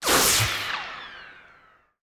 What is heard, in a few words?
resistance heavy; Garry's Mod; tf2